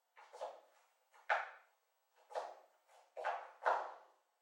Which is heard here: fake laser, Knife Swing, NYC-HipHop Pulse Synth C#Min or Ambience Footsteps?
Ambience Footsteps